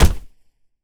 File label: Push Hit; zps; Weapons; Melee